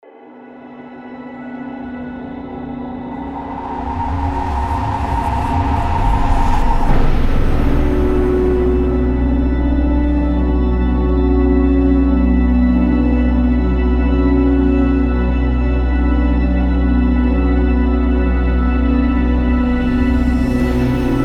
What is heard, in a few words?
ECLIPSE SOUNDSCAPE CINEMA; loopmasters